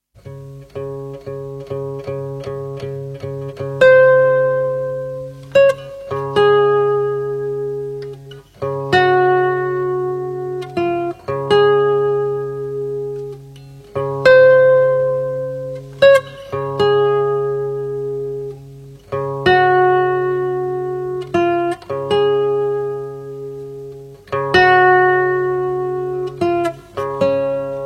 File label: horn